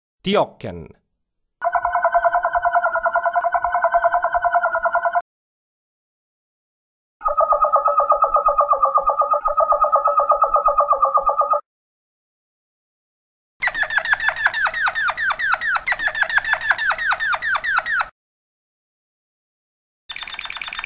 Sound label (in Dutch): Tjokken, waterslager